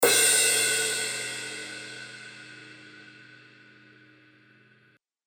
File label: drum clash mix